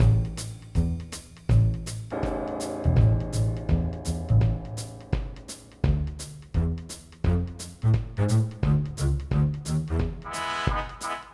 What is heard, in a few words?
Instrumental Track; Snark Busters: Welcome to the Club